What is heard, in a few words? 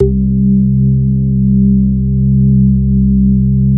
C1, SynthLine; NiceBeats